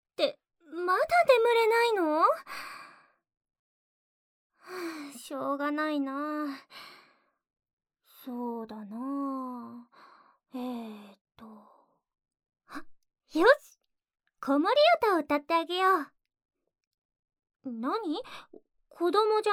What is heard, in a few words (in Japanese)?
子守歌&添い寝(左耳Ver.)